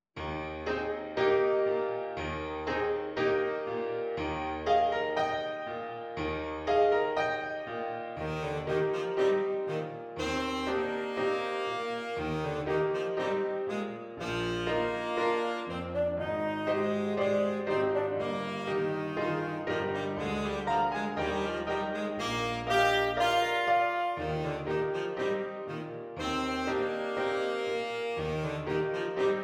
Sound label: Tenor, Saxophone; alto; baritone saxophone